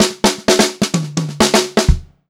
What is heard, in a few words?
128 funky fill, funk groove drumloops; USB Soundscan vol.38; AKAI